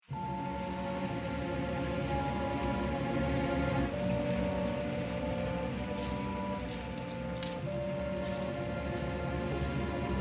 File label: Horror Scene w Sound